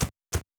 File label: running sequence, footsteps grass; axisrec; step set 1